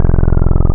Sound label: VV Diode Square, wavetables; Animoog